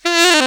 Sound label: sax; East West; Global Sounds